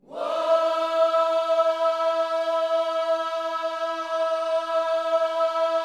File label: "Whoa", Gospel vocal, E4; Spectrasonics; Vocal Planet Vol.1 Gospel; akai